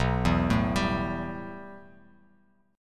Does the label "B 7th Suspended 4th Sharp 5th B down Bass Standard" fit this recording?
Yes